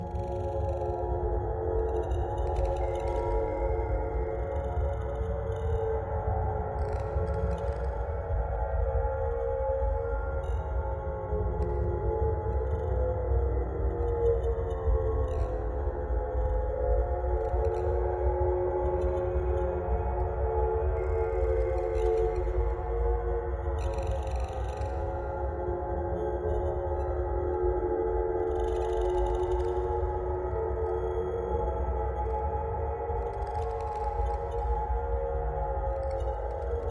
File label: Abstract Ambiences